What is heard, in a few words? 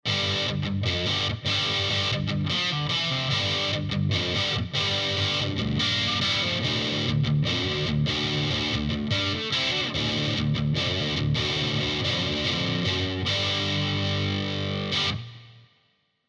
Vox NT15 Highgain